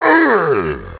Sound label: Bad Hit Sound; Thwomp; Beat Saber Miss Sounds